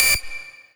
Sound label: lead